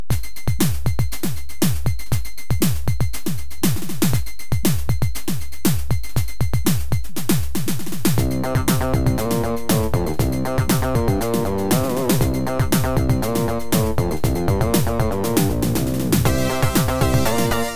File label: Drums; adlib; Edlib